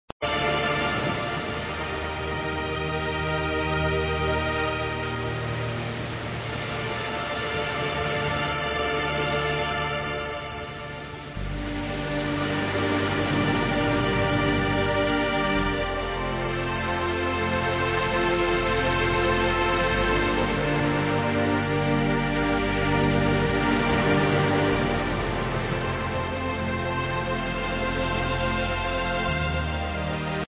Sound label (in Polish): spokojny sen